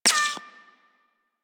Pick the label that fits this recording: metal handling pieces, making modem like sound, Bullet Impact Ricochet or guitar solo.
Bullet Impact Ricochet